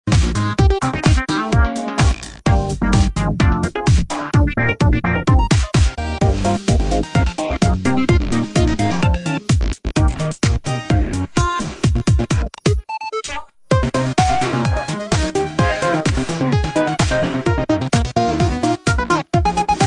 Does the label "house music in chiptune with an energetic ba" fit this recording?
Yes